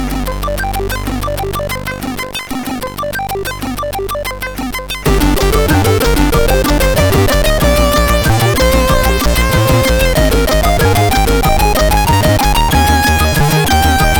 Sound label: Zoomer, sid CSG8580R5